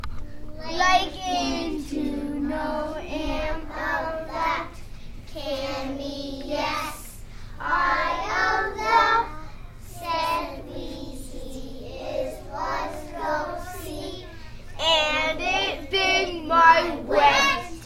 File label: sight word song